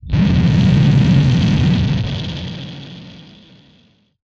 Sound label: Explosion; Space Blasters2D